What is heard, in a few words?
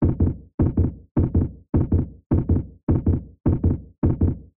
Heartbeat Fast Loop